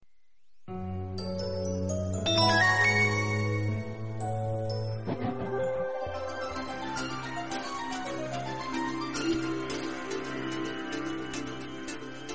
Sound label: rattling coin cute tones ringtone, sms ringtones